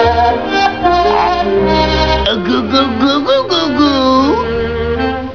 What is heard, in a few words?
googoo; Tom en Jerry